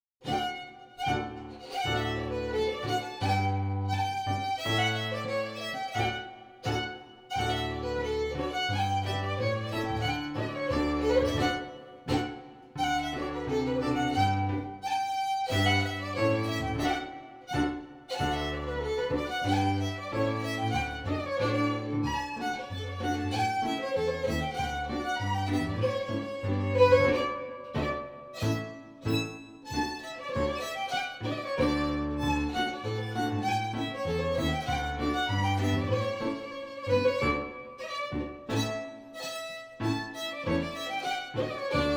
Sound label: FIDDLE PIANO; Jink & Diddle Book o' Tunes 2021